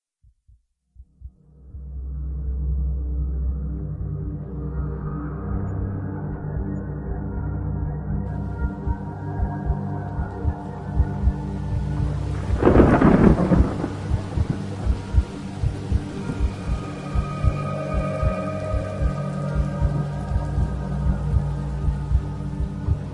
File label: Halloween Horror